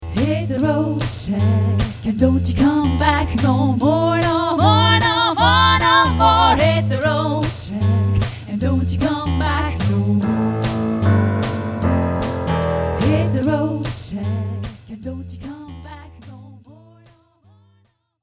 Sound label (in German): Hauptstimme